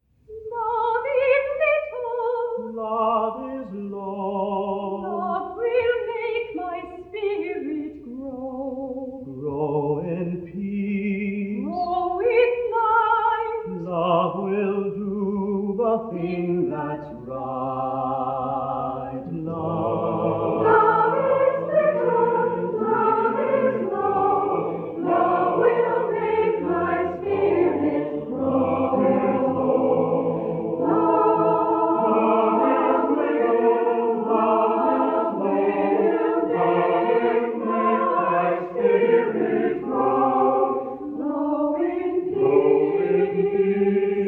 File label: Love Is Little, Shaker spiritual